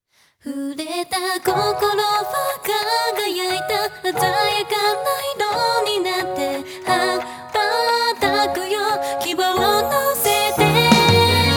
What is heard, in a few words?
Instrumental